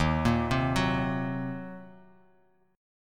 Eb Minor 6th, chord; Ebm6 arpeggiated; JGuitar

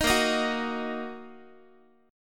A sharp Suspended 4th Sharp 5th down Guitar; A#sus4#5 strummed; 18,18,18,16,x,x

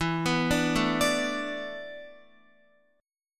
E 7th Suspended 4th, Guitar; E7sus4; arpeggiated; x,7,9,7,9,9

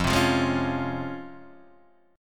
C sharp Major 7th, F, guitar chord

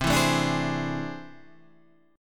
A Minor Major 11th

